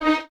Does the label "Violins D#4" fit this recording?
Yes